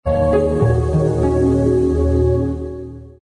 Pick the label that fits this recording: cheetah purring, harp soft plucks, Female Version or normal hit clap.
harp soft plucks